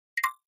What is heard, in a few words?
Flash Beep Dbl HF Holl